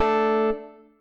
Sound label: countdown; tetrio